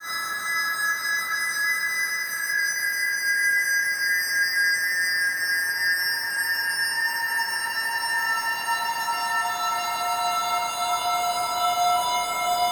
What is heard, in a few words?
Haunted Rooms Open Window Haunting Breeze Fluttering The Curtains